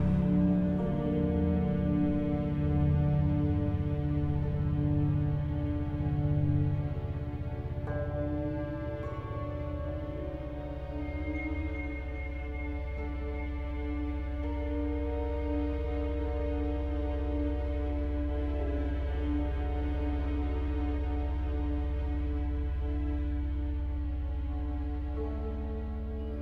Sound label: loop Exploration; the walking dead michonne 1 in too deep